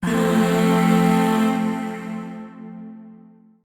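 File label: Shawzin Major Chord; G; Warframe; Zariman; Void Shawzin; Chords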